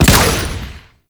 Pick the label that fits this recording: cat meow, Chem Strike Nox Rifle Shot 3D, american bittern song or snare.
Chem Strike Nox Rifle Shot 3D